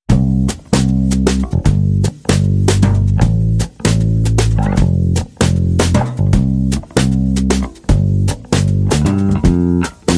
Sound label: Rhythm and Bass Mix